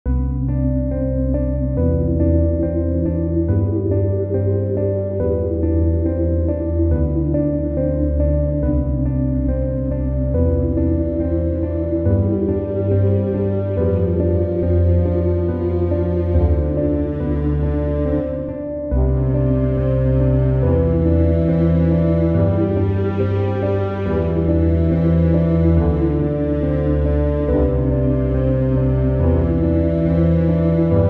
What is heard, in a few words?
Horror Sad Rain